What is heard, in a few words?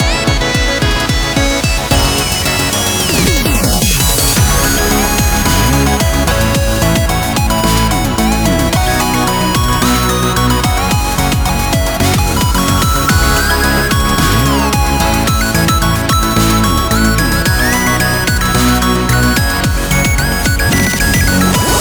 chorus; Rhythm Quest; rhythm-based; sweep it from high to low, for an almost phaser-like effect